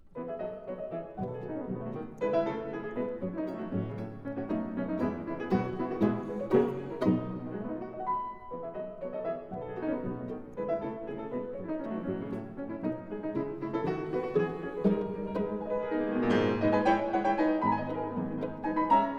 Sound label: Trio for Piano, Violin and Cello in A minor, Allegro moderato; Var． III．; Deutsche Grammophon